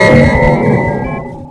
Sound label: activate button